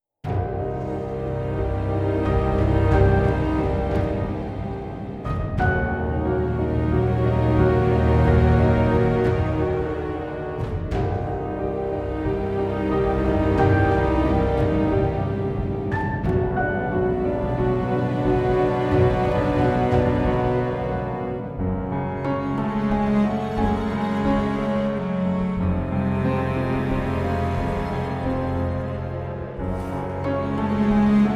Evil

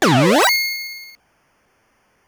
COIN; Star Force; X68000

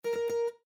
Afternoon Feed Discovered, windows 7

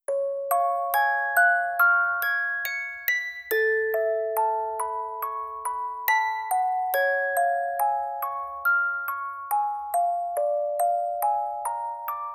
Orgel; Ys VIII Lacrimosa of Dana; Roda

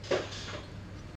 Minecart Move

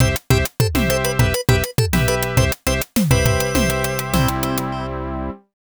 Game Clear; Flappy 2 The Resurrection of Blue Star; X68000

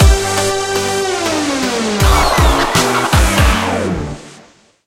Dance Battle Game Lose; club penguin island; android

